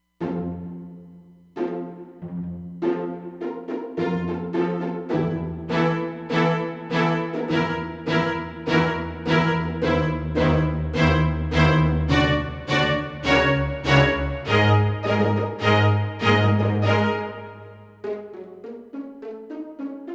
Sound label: Roland Fantom XR